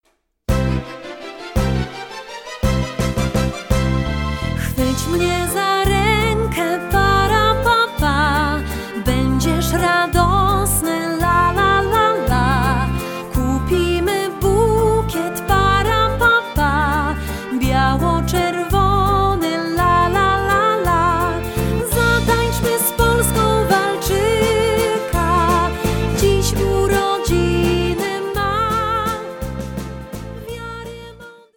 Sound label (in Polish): walczyk na niepodleglosc